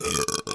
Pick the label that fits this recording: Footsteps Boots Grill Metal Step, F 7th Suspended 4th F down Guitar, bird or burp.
burp